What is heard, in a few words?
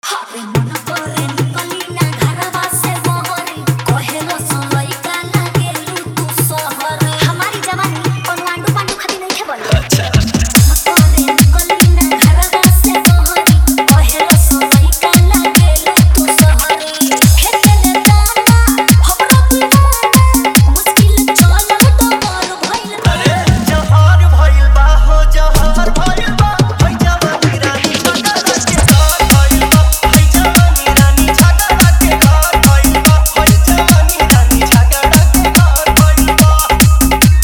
Hard Dhollki Bass